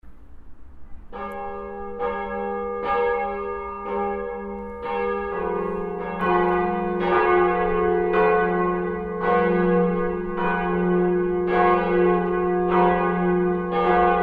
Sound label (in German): Glocken; „Pax vobis“ bzw. „Requiem“; Werktage Advent-/Fastenzeit; St. Lorenz